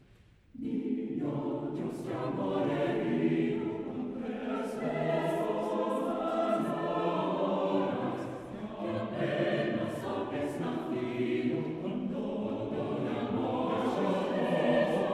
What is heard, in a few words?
Chamber Choir